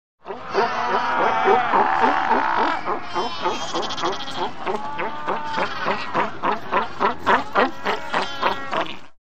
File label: Seal Cape Fur Barks, seals & sea lions